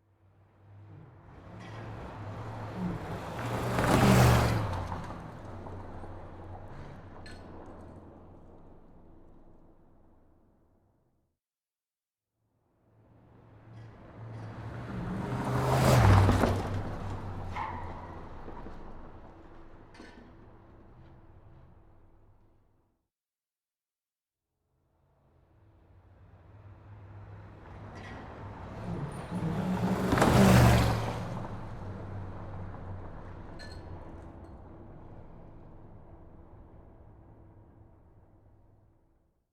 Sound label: muscle car speeding highway